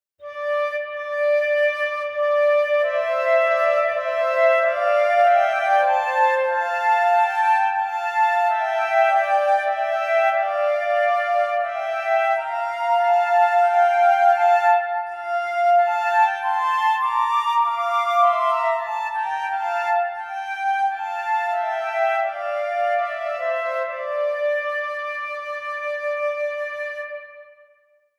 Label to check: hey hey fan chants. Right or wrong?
Wrong